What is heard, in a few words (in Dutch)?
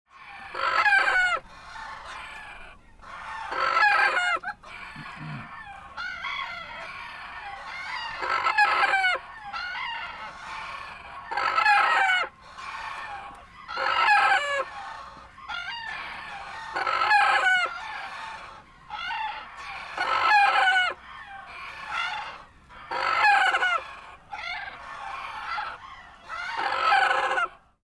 haan kort